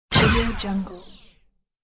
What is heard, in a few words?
laser shot and recover